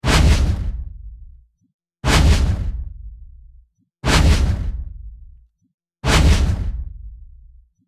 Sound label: Robot footsteps